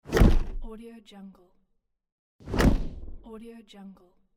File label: wooden pop up